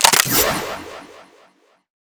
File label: powerup pickup agility; Garry's Mod; tf2